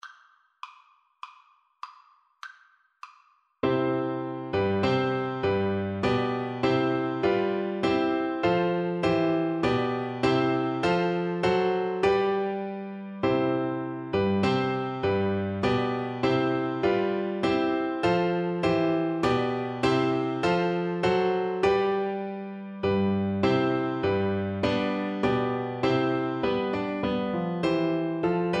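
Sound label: german national anthem, Flute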